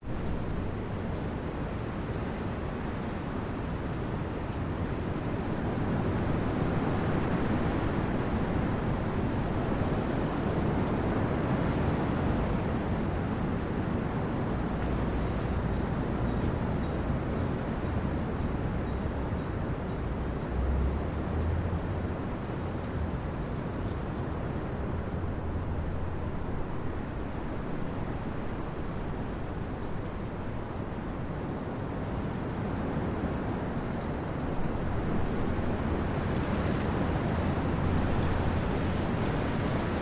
Ambience Windy Night Hill Distant Traffic Leaves Rustling; Skyes Audio